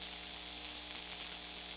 Electric

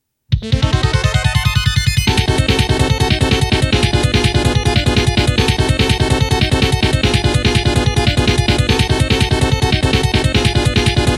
Trap; Yaksa Arkus

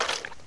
footstep sand

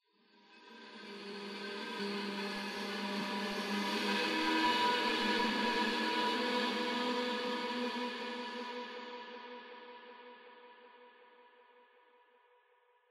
Interior Reverses; The Sinking City

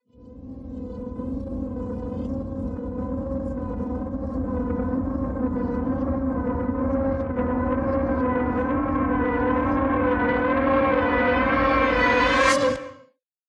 Reverse

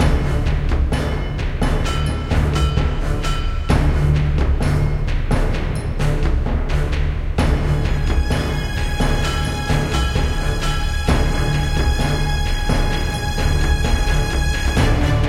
Combat Alt; Damnation

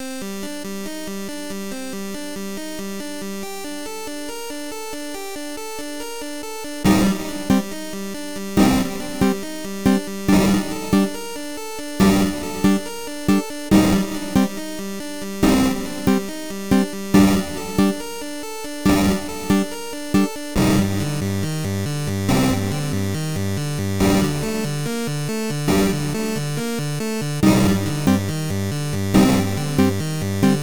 8bit Narration; Lucius Demake

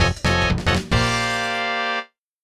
Jingle Comic End

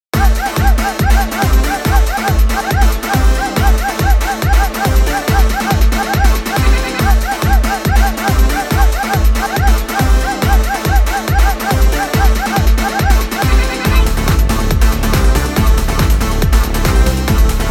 Pump Up The Bass; Clock Work Aquario

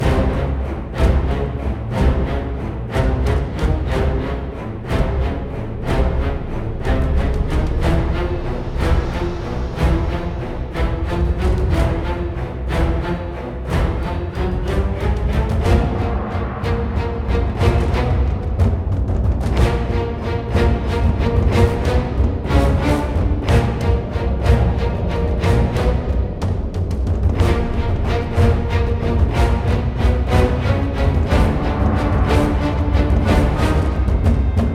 Bossfight Stage Action; ryse son of rome 2014

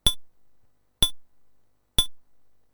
Clunk, Clunk, Clunk; lemmings atari st 1990

